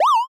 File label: punyon